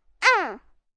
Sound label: Pain Uhm, Grunt, Impact, 1 child, short, pain, hitting, human voice, kid, groan, moan; VoiceBox